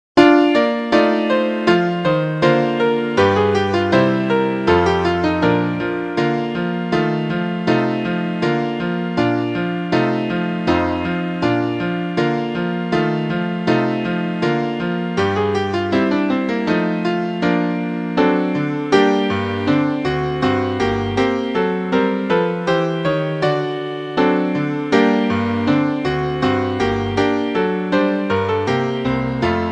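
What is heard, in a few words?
accompaniments, Trumpet in Bb; Vocalise #10; concone; Rudimentary Transposition Studies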